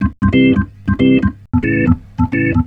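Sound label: reggae organ C; Best Service Advanced Media Trax Modern Composer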